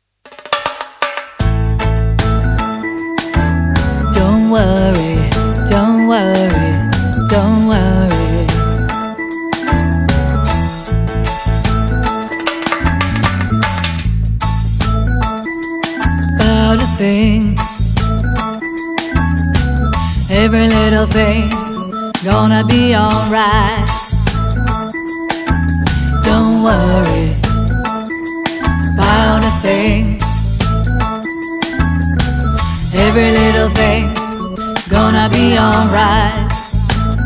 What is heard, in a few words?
Alto